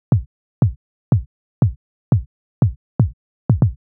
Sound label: Kick Lite; hydrogen